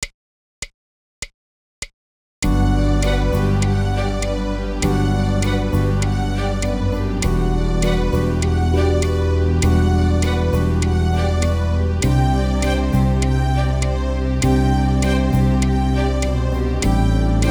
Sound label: Rock Prep; Drum 2